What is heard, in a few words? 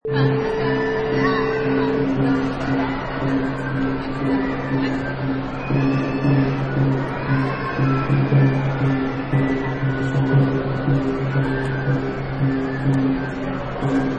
Irish Music with Crowds